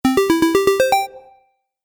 Game App Little Tune, Video Game Sound Effects

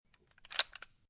Load, Gun Handling; Guns; VRSonic

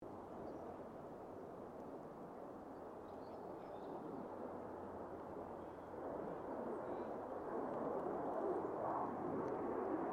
AIRCRAFT CA-27 SABRE PASS STEREO; CA-27/F-86 Sabre; Airplanes

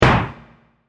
Deep And Short Impact; Low Impact Sound Effects

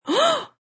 Girl Gasp, A young woman's gasp; Gasping; Voice

